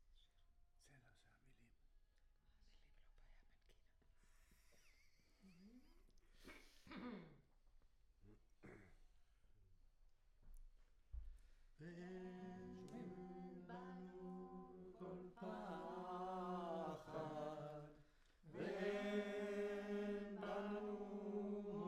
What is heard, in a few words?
Close, Choir